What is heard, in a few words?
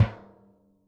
XKICKS, Living Drums